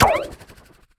Ninja Rope Fire; Worms3D

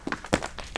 rocks

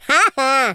laugh; Worms3D; Teenage Angst

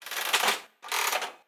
handle pull; Wormpot; Worms3D

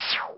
Dig

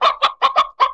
Chicken

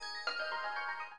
Scroll

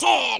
jump, speech, War General

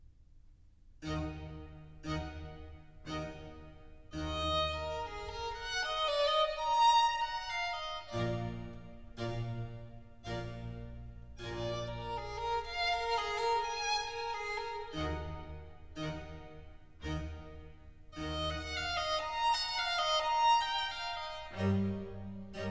Violin Concerto No.3 in G major Largo; R.310; Vivaldi

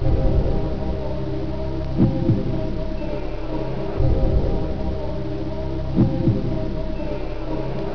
bgsound

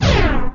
woosh pop

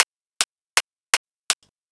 win loop; Worms3D

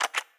button; Wormpot; Worms3D

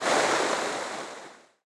Geysir

